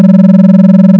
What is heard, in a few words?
beat x3